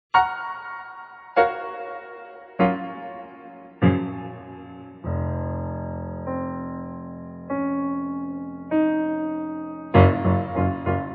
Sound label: Reverb; Cakewalk